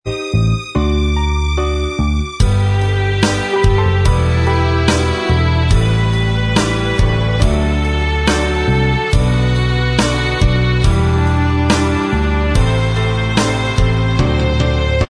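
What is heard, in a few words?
instrumental, backing tracks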